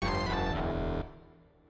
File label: ensemble